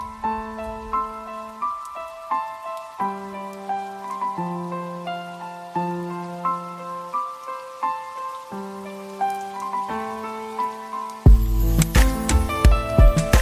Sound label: kizomba remix